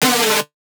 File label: synth screech oneshot F# dry; Synth Hits; GhostHack